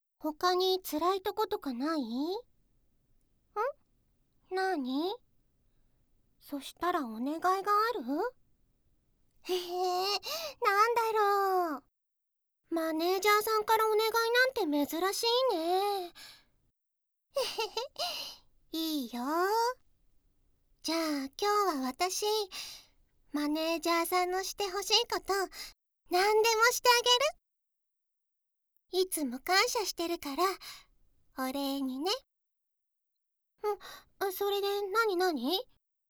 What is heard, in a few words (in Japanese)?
耳かき（右）, ASMR